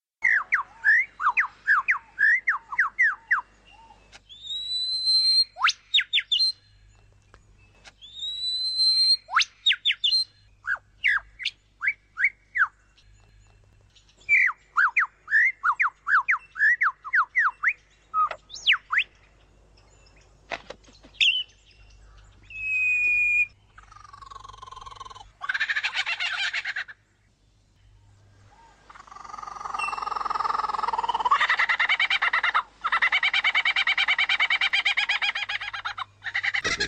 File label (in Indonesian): suara lyrebirds variasi